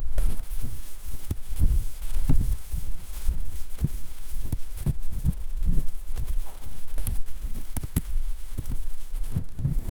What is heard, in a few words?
mouse footstep on grass; animal